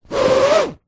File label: household blind fabric rub against